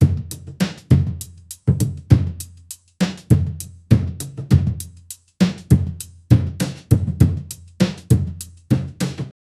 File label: faint traditional drumbe